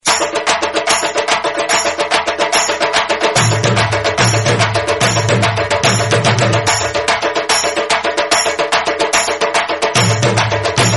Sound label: Tamil Drums